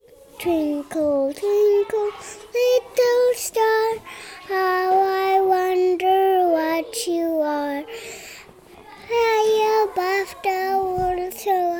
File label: twinkle